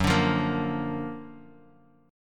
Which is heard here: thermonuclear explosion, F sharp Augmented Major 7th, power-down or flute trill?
F sharp Augmented Major 7th